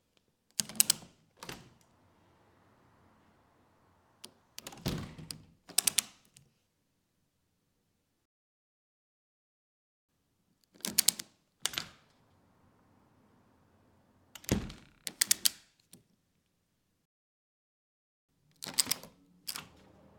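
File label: plastic doors open close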